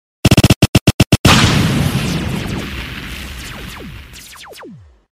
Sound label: Dj Sound Effect Rapid